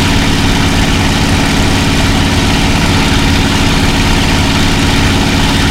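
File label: mustang2 cockpit right, engines; War Thunder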